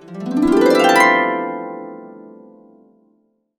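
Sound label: C major up, harps; Complete Harps; USB Soundscan vol.62; AKAI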